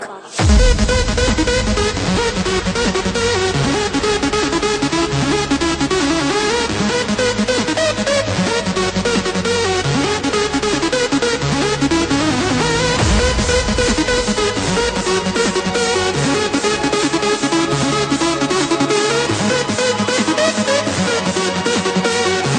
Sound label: loud trance ringtone